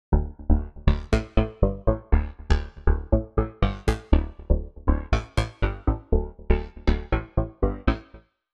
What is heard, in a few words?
Juno Blips, Roland JU-06; SoundDiviner Project; Roland JU-06 Pack10